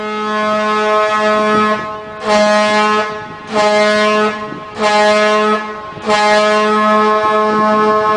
Giant Vuvuzela

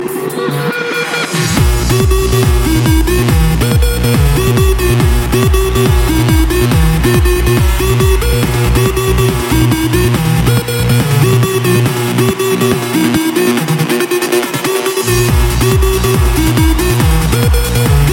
Trancestyle

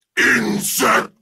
Insect

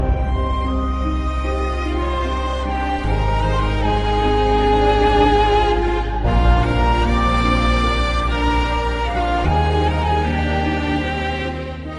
Background Sad; Arnav

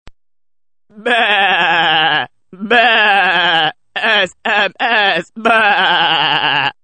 Sms Sheep

